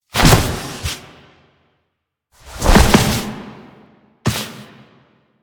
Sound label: Foley; Cinematics; Operator; Warframe; The New War; Throne Room